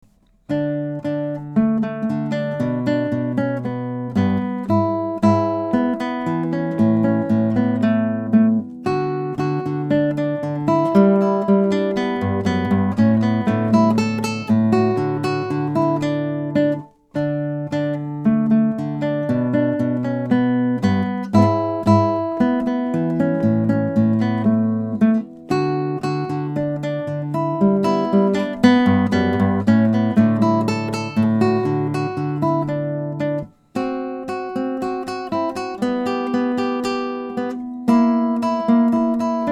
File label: Samba